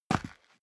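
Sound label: step boots single concrete grit single stair down, footsteps; schritte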